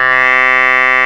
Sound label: DIRTY CLAV, clavinet; Zero-G; Funky Elements